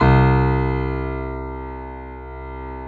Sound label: PIA PRO, Roland